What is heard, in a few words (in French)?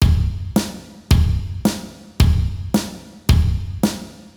rythme